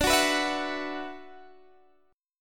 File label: D 6th Add 9th, Guitar DADGAD